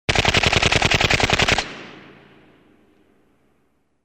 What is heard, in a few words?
gun